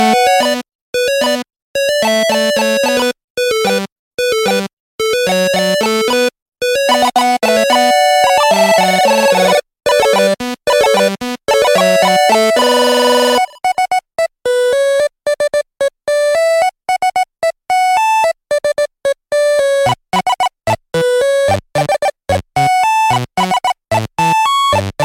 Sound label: 8 Bit Fun